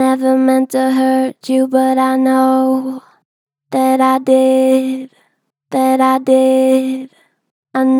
Gentle Flat, Never meant to hurt you, Vocals; Harmor Vocals

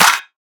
Clap, Dubstep

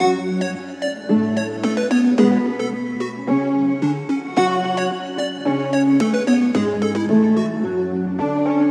Melody F# 110, Hip Hop and Trap; Signal; Ghosthack

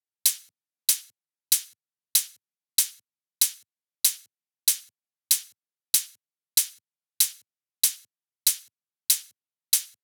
Synthwave Kit 95bpm Hihats; Drum Kits; Stems; Ghosthack - Ultimate EDM Drums; Synthwave Kit 07

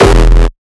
kick oneshot monster E; GhostHack

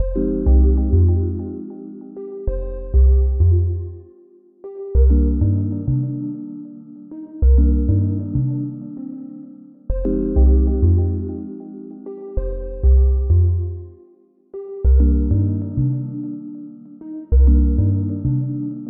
97 BPM C Min, Melody Loops - Afrobeat; Cymatics; Mystery Pack Vol 4; Ghost

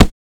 Short foley

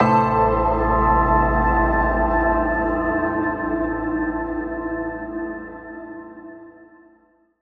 Ambient Bells - Modular Bells - Am 60Bpm; Instruments; Ghosthack; SkyRealm

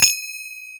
finger cymbal

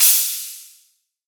Crash; Cymatics; House Starter Pack; Cymbals

